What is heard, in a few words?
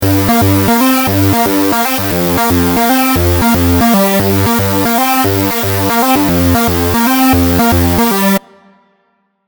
guitar synth chorus